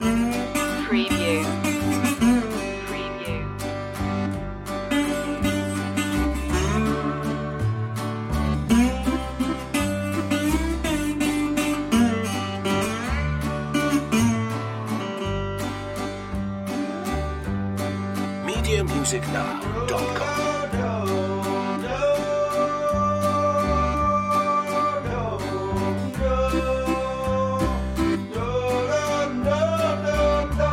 cajun bon ami loop

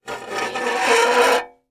Metal Scrape

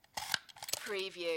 hole puncher

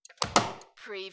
Domestic Door, Wood Door Close

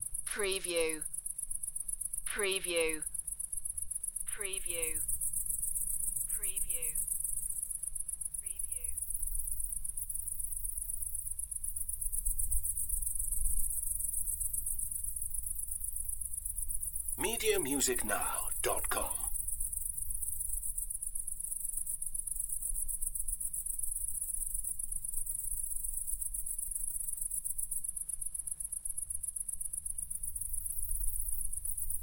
Crickets Chorus, Cicadas Singing; Nature Sounds / Ambiences

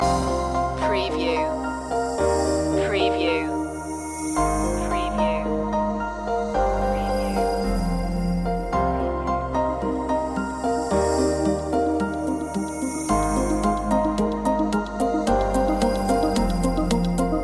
computer network loop

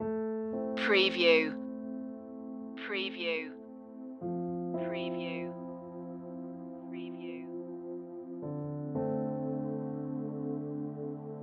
nostalgia no clarinet

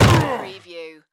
Football Tackle Hard; Sports & Games / Football / Soccer

